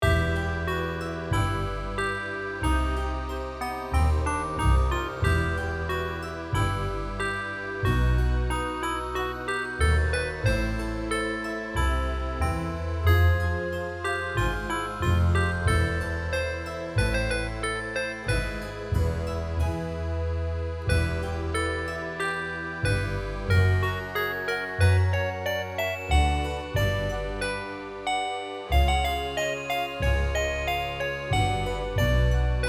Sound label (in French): musique Standby; wicked witches